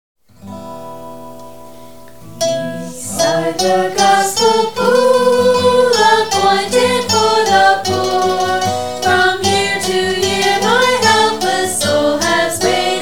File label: Gospel; North American Recordings